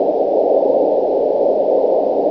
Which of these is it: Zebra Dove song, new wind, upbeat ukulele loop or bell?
new wind